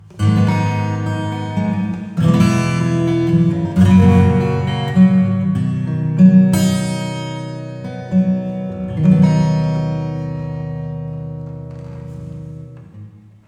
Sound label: Acoustic Plate; Reverb Compare